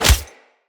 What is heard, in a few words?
Unarmed Tempest Punch Impact Default